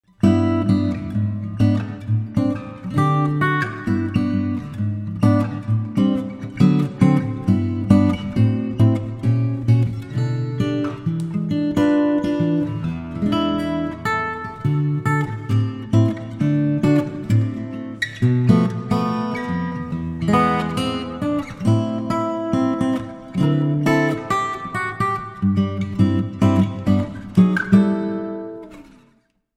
guitarist

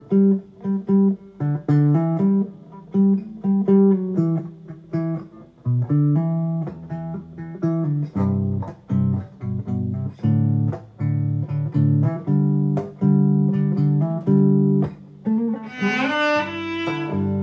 strings upbeat

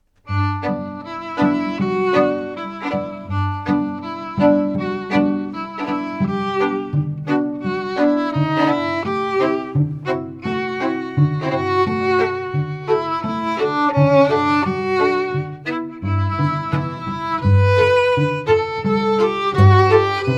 strings; classical musicians

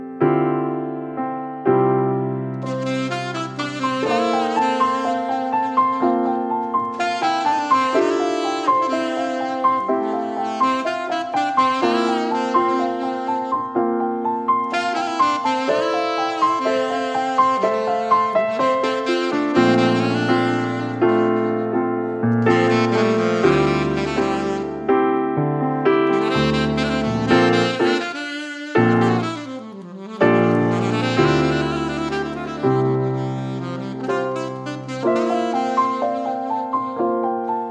sax, saxophonist

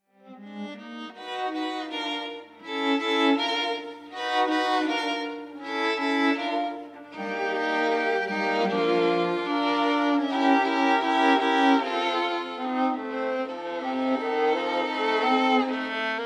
strings

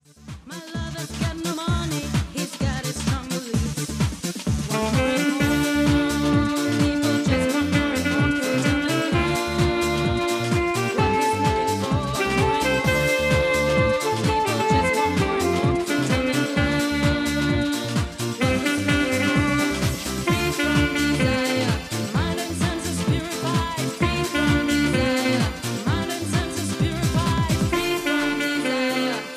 sax

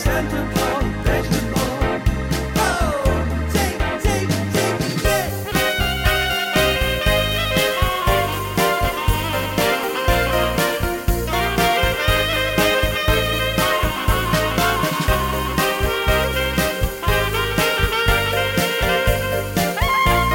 sax

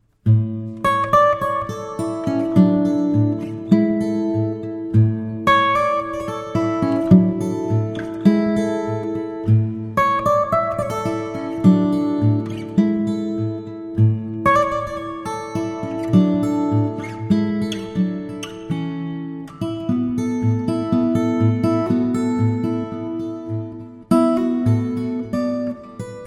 guitarist

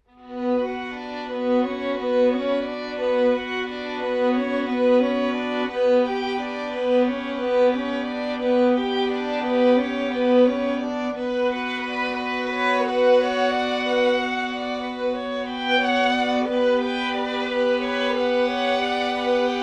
strings

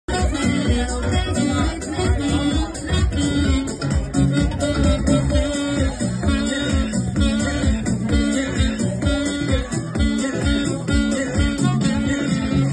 sax live

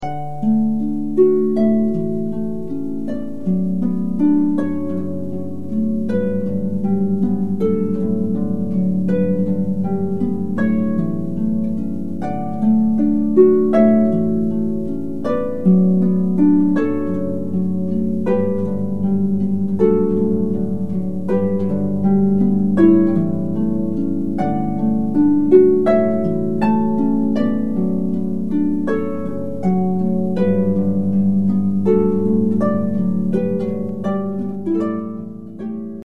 canon in D

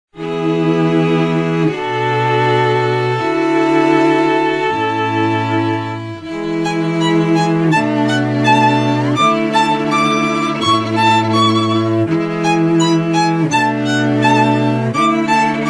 strings

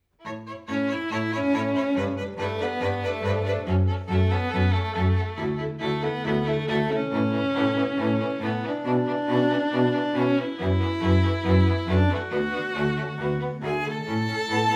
strings

